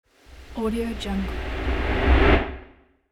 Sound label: air slide transition